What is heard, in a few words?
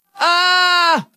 screaming; roger wilco